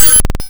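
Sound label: waveform FM sawtooth triangle envelope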